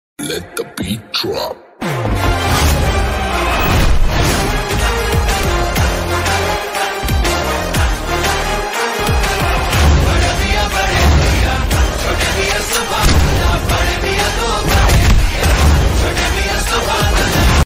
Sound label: mobile phone ringtone